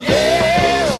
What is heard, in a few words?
yeah SUNG